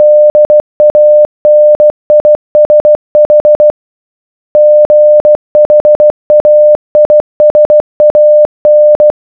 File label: danish, morse